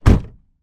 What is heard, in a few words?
car door close internal